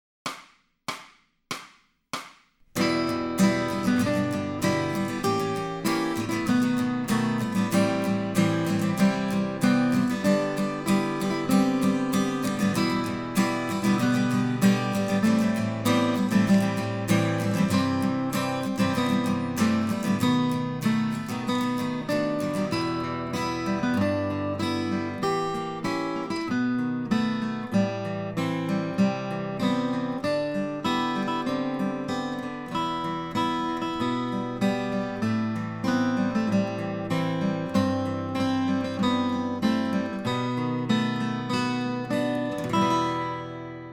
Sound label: Accompaniment, acoustic guitar; Grade 7